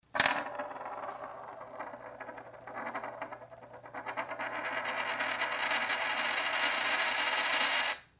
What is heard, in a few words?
Spinning Coin